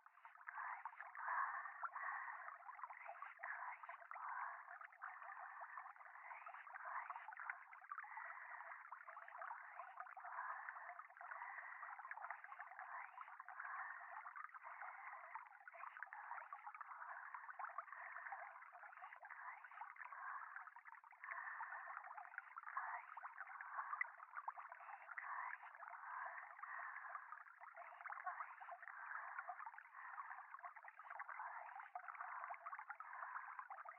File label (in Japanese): しこしこ 川のせせらぎ